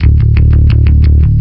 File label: 170 NuMetal Bass D; Rock Hard